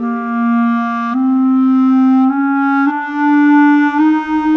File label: Brass Winds; Sonic Foundry (Sony Creative Software); Orchestral Series VOL-3 - Cinematic